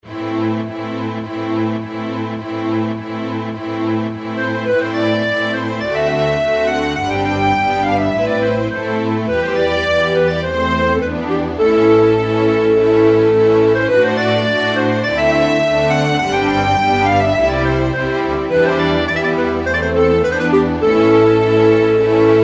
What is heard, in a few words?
orchestra